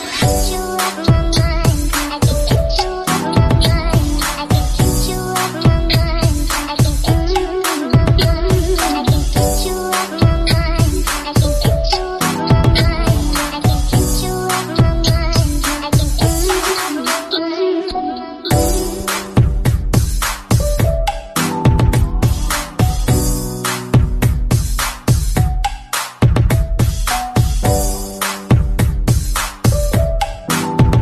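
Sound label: ringtone remix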